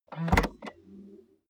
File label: car bmw e46 exterior trunk open